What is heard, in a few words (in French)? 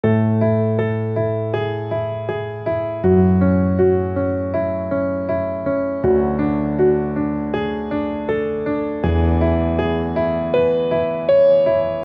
piano seule